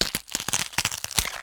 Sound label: rip carcass; plague